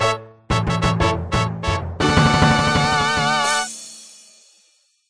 Firemaking level up

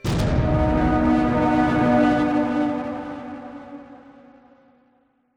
Music Stinger; HDF Ch2

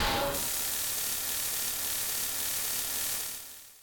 shot proj; Immortal commander

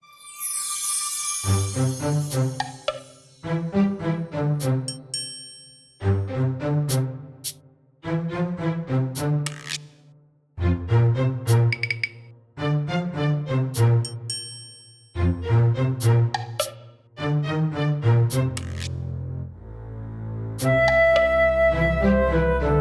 Scene (Funny)

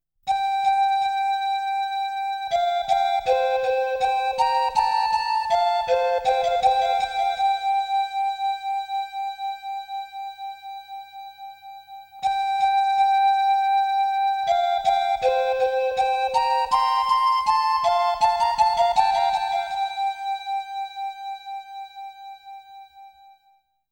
Mysterious tone; Estpolis Denki 2; Lufia 2 Rise of the Sinistrals

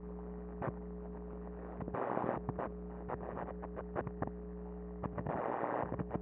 eletrical Sound

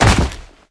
Footsteps

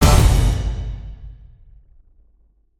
Level End; PS3; Xbox 360

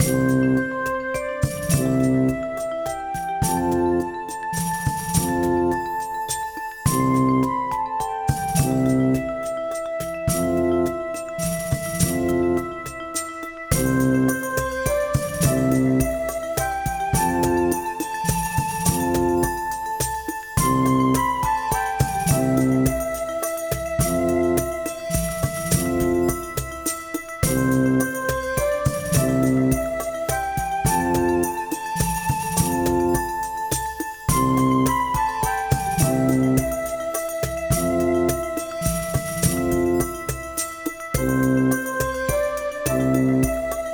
menu music

